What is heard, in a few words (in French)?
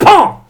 Pan